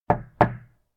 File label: knock konkon; anemoi